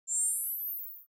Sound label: night vision starting